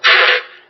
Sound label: fart short